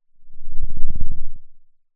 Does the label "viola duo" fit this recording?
No